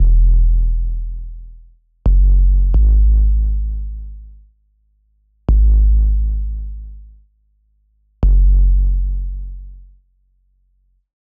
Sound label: LIQ DNB SONGSTARTER E 175 BASS